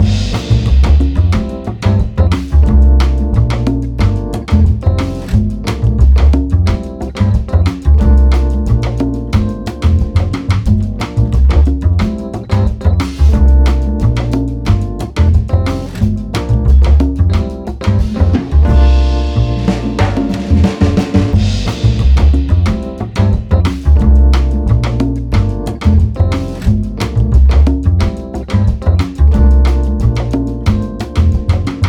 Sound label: Bossa; 10 Second Run